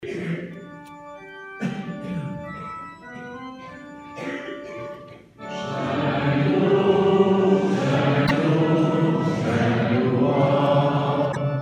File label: santus, chants basques; Sanctus